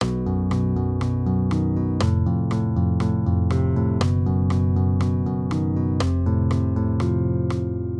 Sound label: maj triads; B-F; accompaniment